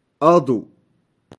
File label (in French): Vent de sable; Adou